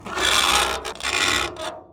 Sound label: metal scrape